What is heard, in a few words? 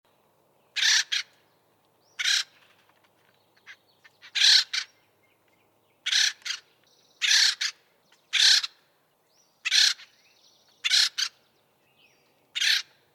Woodpecker Sound